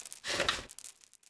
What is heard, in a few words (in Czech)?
rolety; Mafie 1